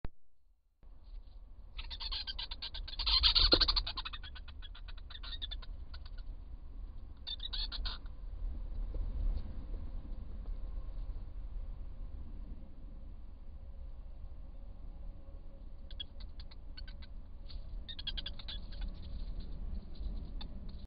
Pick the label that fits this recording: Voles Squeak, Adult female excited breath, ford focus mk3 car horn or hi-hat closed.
Voles Squeak